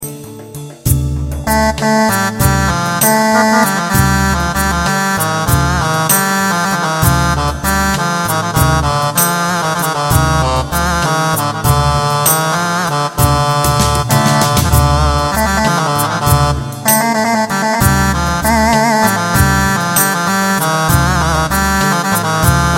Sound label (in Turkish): mey gw8